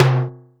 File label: Low Timbale, Roland SC-88 drums